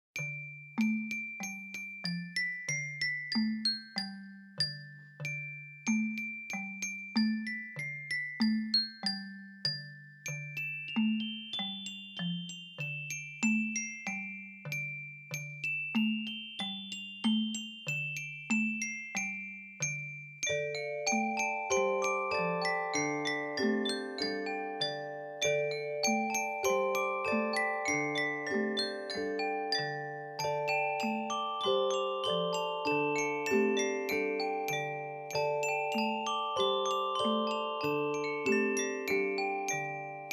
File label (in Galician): melodia srilanka